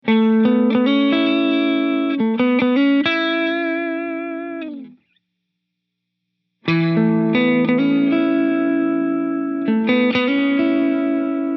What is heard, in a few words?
Hall Concert Medium Long Decay